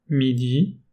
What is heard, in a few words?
midi